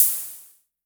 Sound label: Hi Hats Open; LIMAT Div Dept